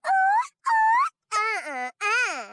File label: Woman Dance Happy; Zomboid; Lifestyle